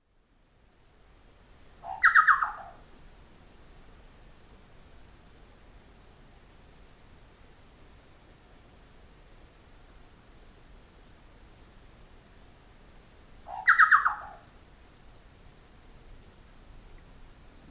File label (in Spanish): chucao; Scelorchilus rubecula